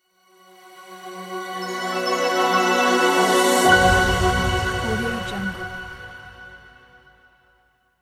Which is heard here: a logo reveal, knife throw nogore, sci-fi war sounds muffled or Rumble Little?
a logo reveal